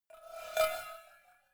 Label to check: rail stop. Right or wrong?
Wrong